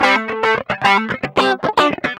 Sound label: GUI, LICKS; 110 LICKS